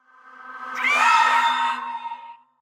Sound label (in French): Banshee Cri; Phasmophobia